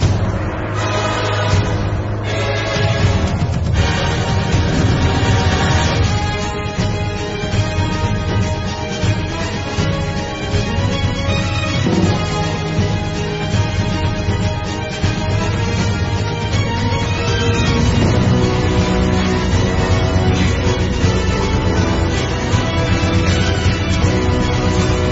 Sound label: Battle BGM; Localdia Chronicle; Android game music